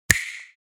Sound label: Cinematic finger snap